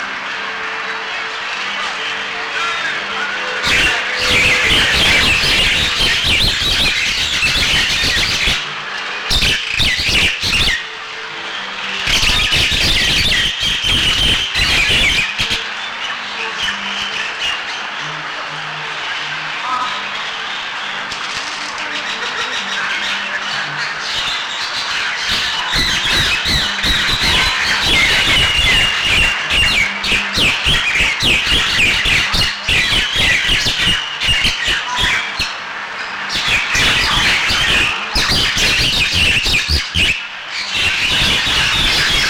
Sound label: Banyan Tree Lahaina